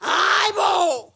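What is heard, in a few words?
FEMALE RAVE; Spectrasonics; Heart of Africa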